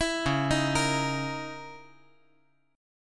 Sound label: Db Minor 6th Add 9th Db down